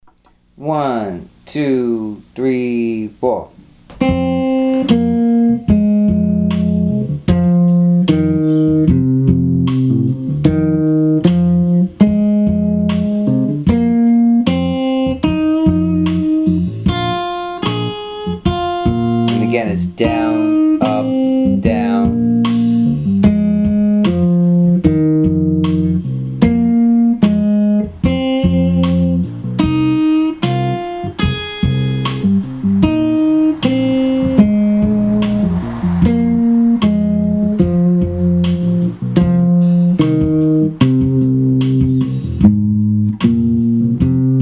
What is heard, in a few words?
blues